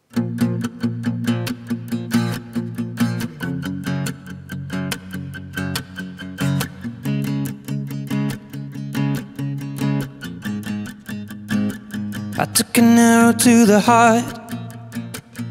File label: acoustic